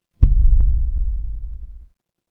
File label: transition impact hit